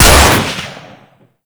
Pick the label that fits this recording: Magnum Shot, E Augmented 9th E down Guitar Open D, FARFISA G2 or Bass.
Magnum Shot